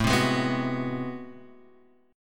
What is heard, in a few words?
A Minor Major 13th, down